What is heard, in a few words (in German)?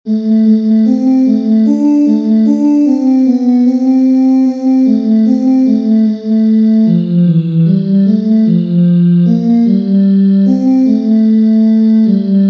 tenor; quempas